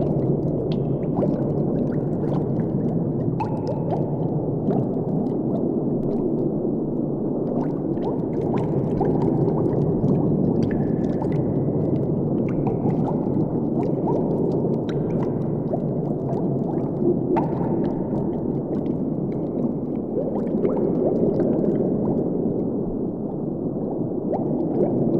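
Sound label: Ambience Underwater; Veros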